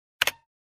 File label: Quick Keyboard Key Press